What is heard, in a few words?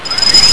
Fade In; Glider PRO